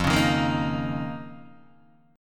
F 7th Suspended 4th Sharp 5th F down Guitar Open D